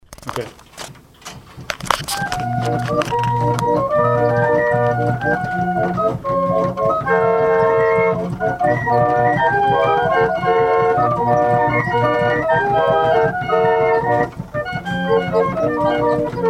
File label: organ clock; Dan Toths